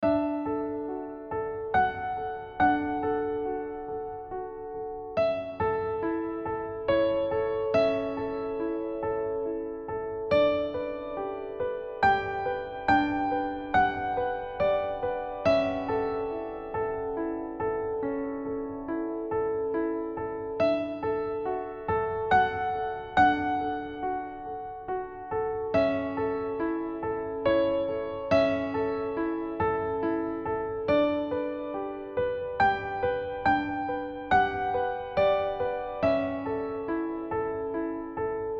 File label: Happy Baby Solo Piano